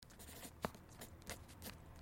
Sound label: drawing; ai interactive comic book